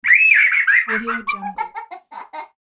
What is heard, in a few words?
little girl laughing; human sounds